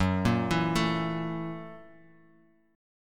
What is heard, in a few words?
F sharp 7th Sharp 9th, chord; arpeggiated; 14,13,14,14; JGuitar